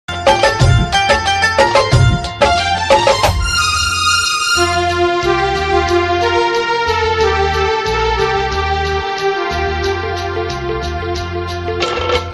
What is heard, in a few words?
hindi ringtone, love ringtone, punjabi ringtone, instrumental ringtone